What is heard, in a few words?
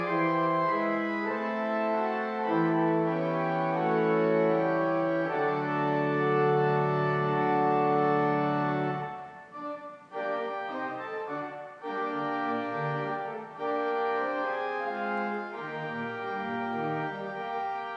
organ recital; calumet art center